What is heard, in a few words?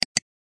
Mouse Click Sound